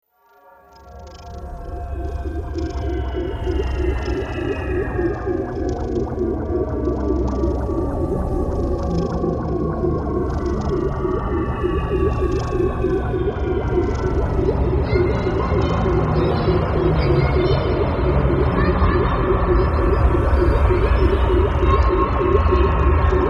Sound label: thunder bay horror